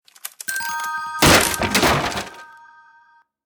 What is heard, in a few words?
trident finish; Treasure house